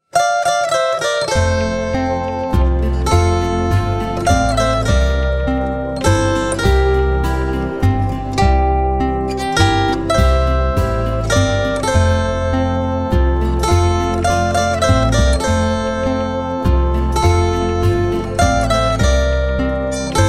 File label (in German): weihnachten, zither; weihnachten mit der zither